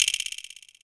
Lop Shake; Quasimidi Rave-o-lution 309

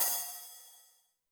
open hihat, Zoom MRT-3B

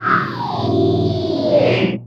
CYCLOTRON; Distored Reality

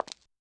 F Step; Yamaha DTXpress-II

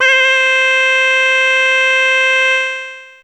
La C5, Humans; Fairlight CMI IIe; Humans1

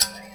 Finger Hit, Prepared Kit; Battery 3 Sample Kits

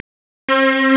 STRNG; CMP - Analogon

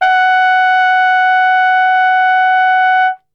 F#3, Brass; Best Service; Brass Super Section

